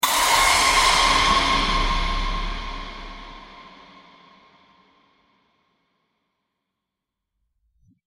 evil glitch

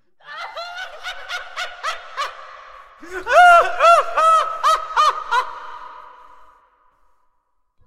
scary laugh

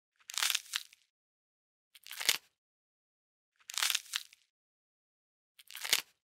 crush sound

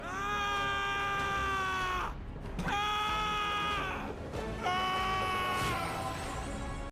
100 screaming; vibetoons

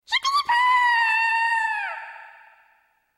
scream

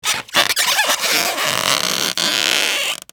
circus balloon rubbing

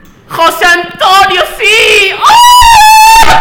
aaahh

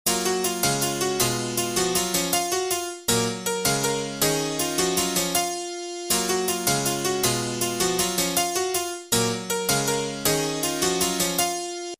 clavier

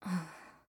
breathe normal

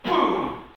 boom boost

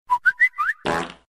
whistle fart

